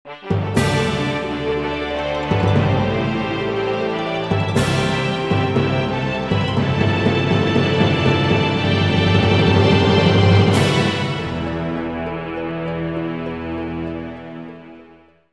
Victory!; Dragon Seeds

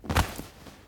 Ground Impact

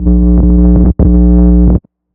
bass stopping

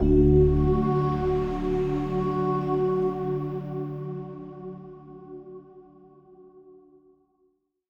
Stingers sting positive

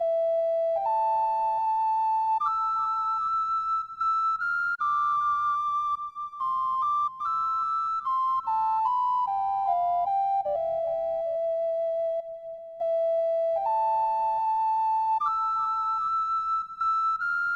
Ocarina Version

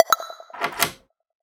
on passcode pass

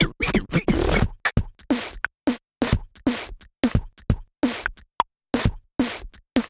Jingle, Rap; Ba-KAW Form

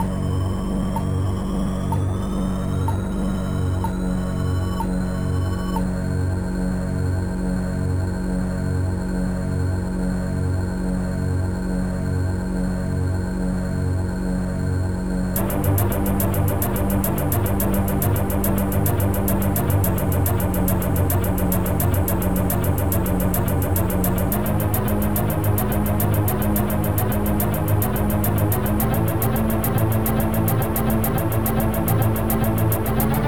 Soul Tomb Ambient; Perihelion The Prophecy; Amiga

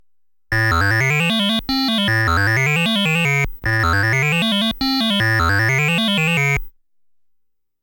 Challenging Stage Start; Namco Graffiti 1; NEW RALLY-X

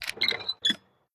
Diorama Squeak; Candy Crush Soda

